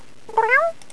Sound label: meow